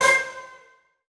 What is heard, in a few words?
Multimedia Internet CD-Rom Flash Beep Mechanical